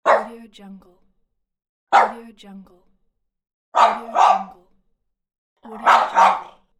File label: dog